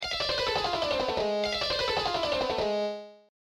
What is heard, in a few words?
Sweep Scale